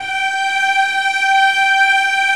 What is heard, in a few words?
CELL G4, VIOLINS